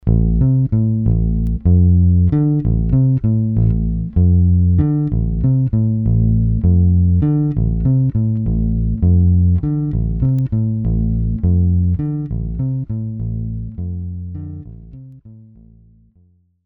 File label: bass; BIG MF103